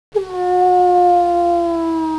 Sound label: howl